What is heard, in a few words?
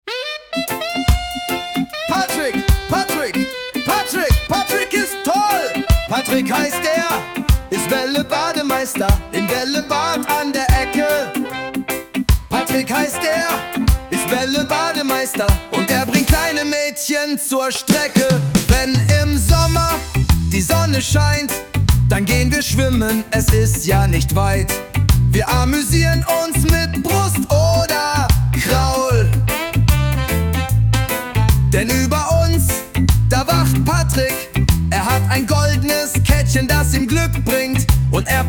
Reggae Version